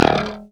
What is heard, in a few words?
db2 staccato, glowly bass